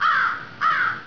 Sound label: crow